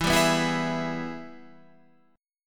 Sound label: Ab Minor Major 7th Sharp 5th, chord